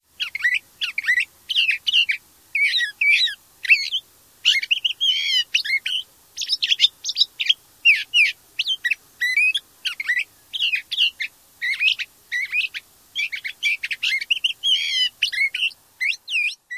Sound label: song; AZ